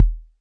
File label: 606 kick, drum machines; 606 Basic